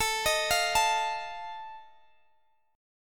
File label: D sharp Suspended 2nd Flat 5th, chord